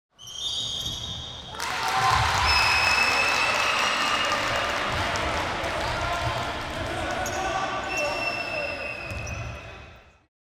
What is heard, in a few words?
Score, Applause, Cheers, Yells, Whistlings, Basketball Game; Sound Ex Machina; Basketball Game Pro